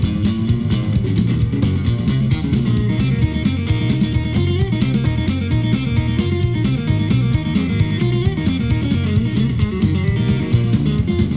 blues

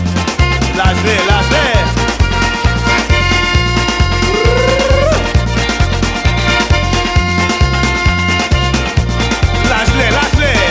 blues

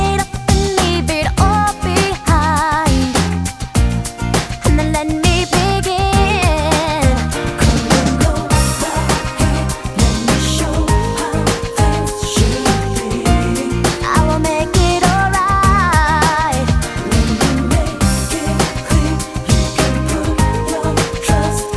pop

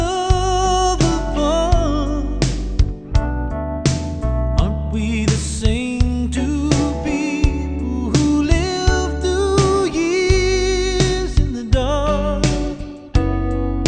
country

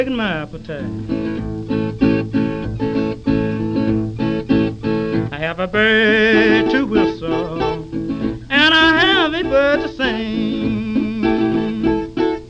blues

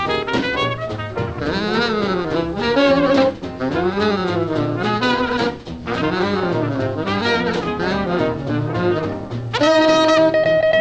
jazz

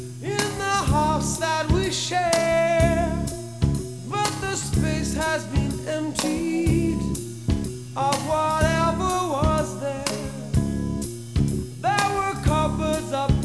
rock

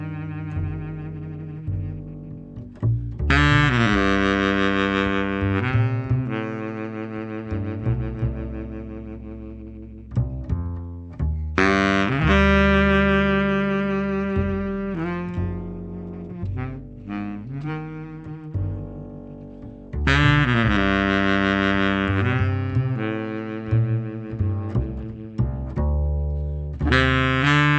jazz

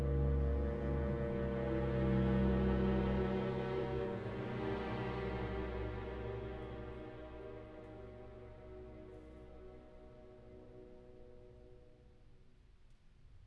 classical

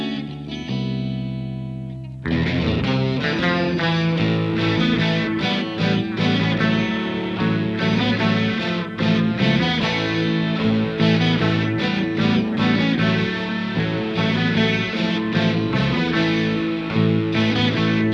rock

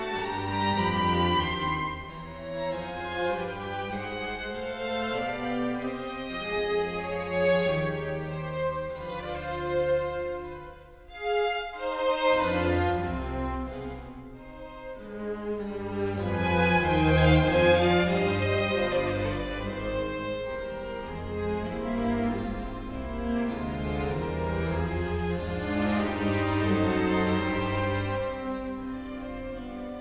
classical

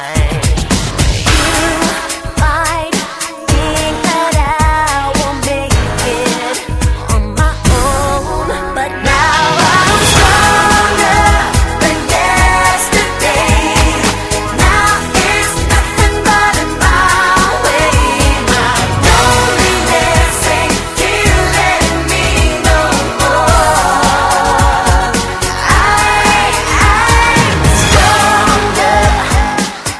pop

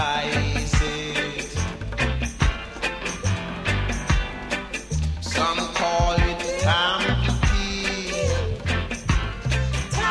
reggae

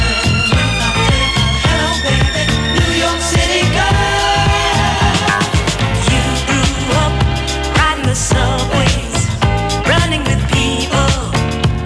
disco

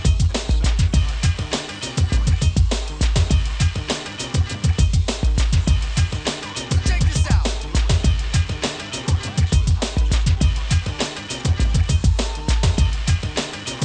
hiphop

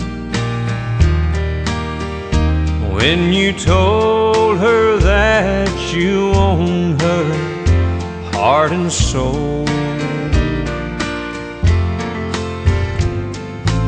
country

